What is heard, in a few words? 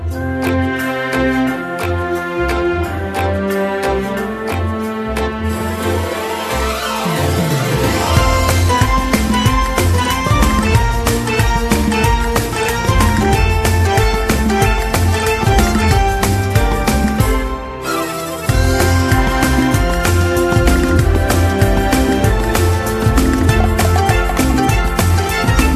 Dance Theme Instrumental